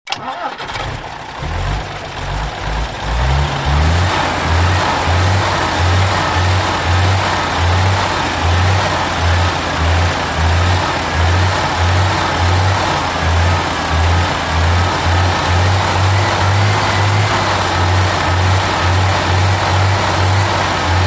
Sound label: Bus Start Away Coach S